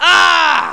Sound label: scream